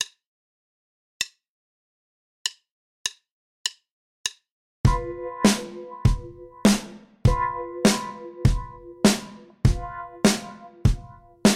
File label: 12 Bar Blues In G no Bass